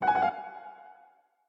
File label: Piano Flutter; Google Pixel 4; Classical Harmonies